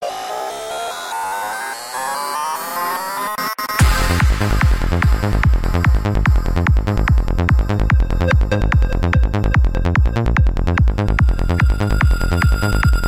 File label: Speed Electronic